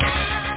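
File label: bass drum + noise; star-bdrum+noise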